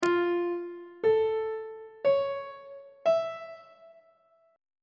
d harmonic minor 7th chord 3 is f augmented major 7th on treble clef